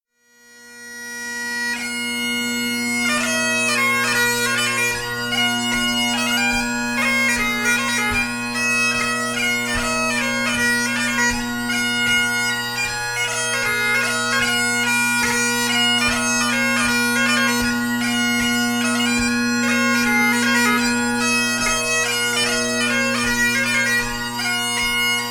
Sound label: marches hornpipe; lochbroom